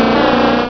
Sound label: cry lickitung; direct sound samples